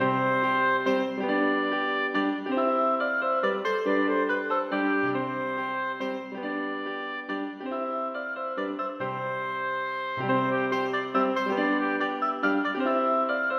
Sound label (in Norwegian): Menuet for obo og piano; Aamodt